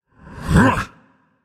grunt attack; smite; voicepack; set

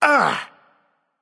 grunt attack; Smite; baronsamedi

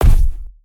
footstep; smite; atlas skin3

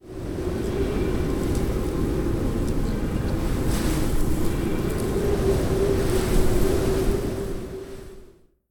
lobby wind; smite; tiamat

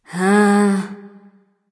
confused; Smite; athena

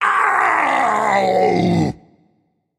ouch

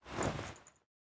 footstep cape; smite; Apollo

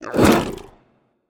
kraken spit; smite; poseidon

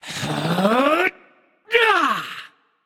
battle cry; smite; voicepack; ganesha

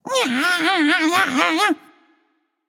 tongue, voicepack; smite; jorm

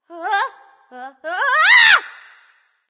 fear; smite; voicepack; jingwei

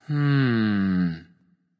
thinking; smite; voicepack; rama

